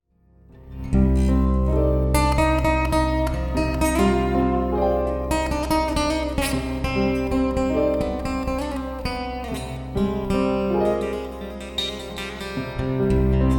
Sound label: guitar solo; Instrumental Samples from Solo CD